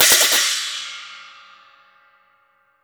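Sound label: LARGE CYMBAL, CHINESE PERC; Spectrasonics; Heart of Asia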